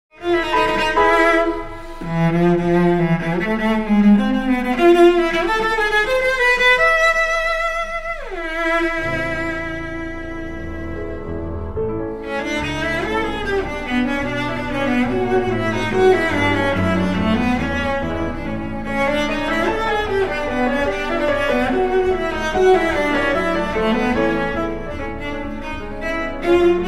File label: Cello Concerto; Saint-Saems